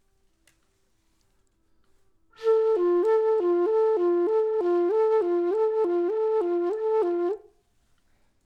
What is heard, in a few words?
flute